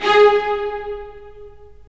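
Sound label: STR, strings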